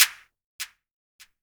clap, Korg ER-1; Kit 14

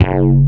106 Bass F# One Shot

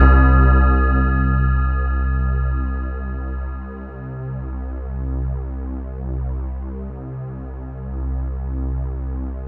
C2, Synth Line; 90 s Sample CDs; NiceBeats; SynthLine VOL-31

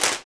CLP HANDS, Handclaps; Roland LCDP14 Africa VOL-2

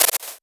clap, Korg Prophecy

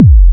Smack Kick, Drums; Kicks

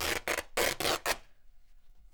Canvas Bag Rips

